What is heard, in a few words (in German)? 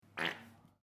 Majonese aus Flasche druecken